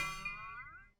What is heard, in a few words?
drunk guitar